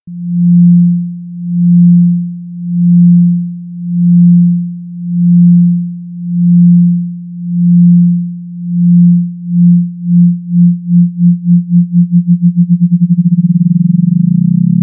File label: amp mod no clip